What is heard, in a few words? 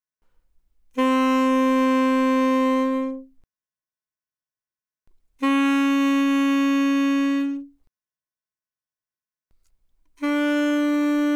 Alto Sax No Vib ff C4 B4, alto saxophone